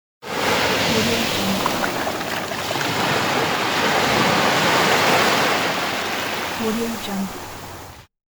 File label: sea; djjeep